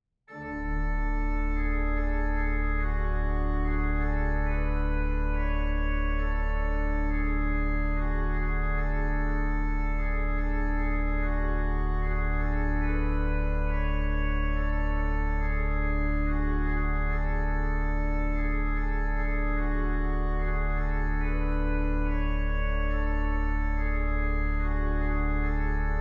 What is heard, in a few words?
air melody, organist